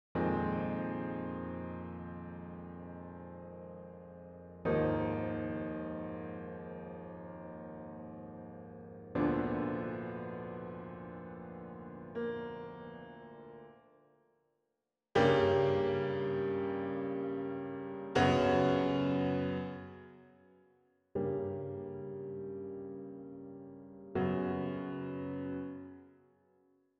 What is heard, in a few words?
Piano